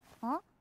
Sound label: noises; gabriel dropout; satania